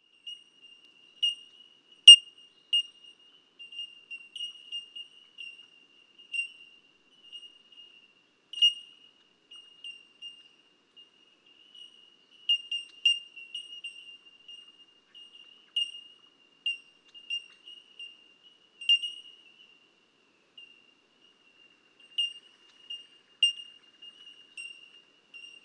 Bell Miner

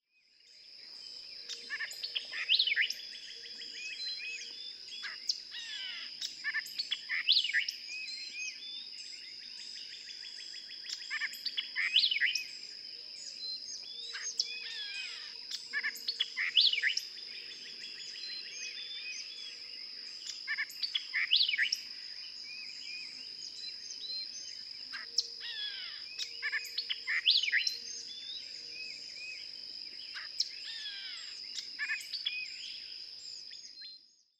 White-eyed Vireo

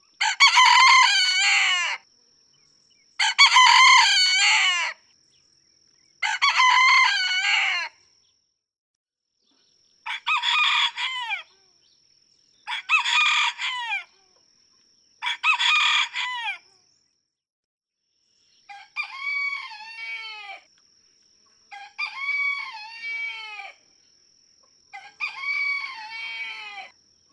Red Junglefowl; chicken

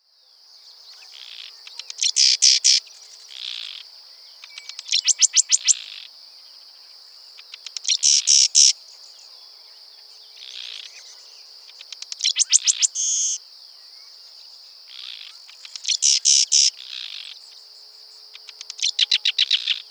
Sedge Wren

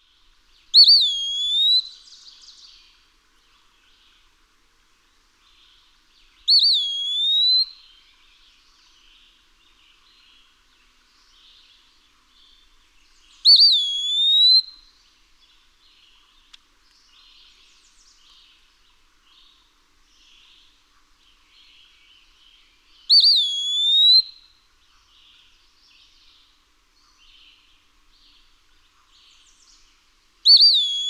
Eastern Wood-pewee

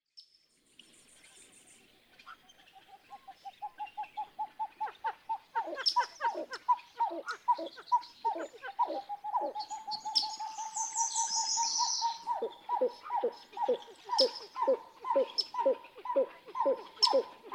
Pied-billed Grebe

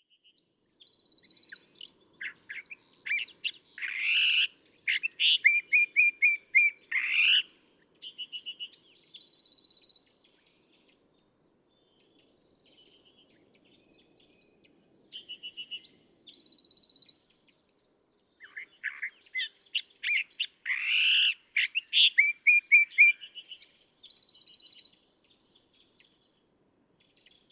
California Thrasher

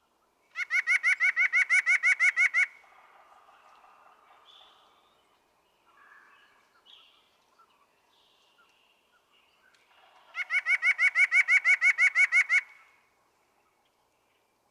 White-breasted Nuthatch

